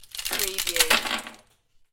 Ice Cubes, Twist Ice Cube Tray, Fall Onto Kitchen Worktop